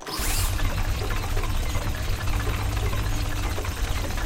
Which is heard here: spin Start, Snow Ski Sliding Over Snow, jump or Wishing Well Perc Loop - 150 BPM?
spin Start